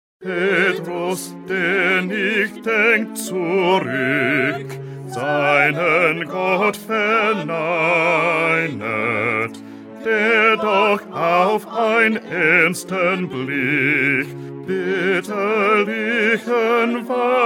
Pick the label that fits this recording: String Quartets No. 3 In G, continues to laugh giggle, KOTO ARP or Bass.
Bass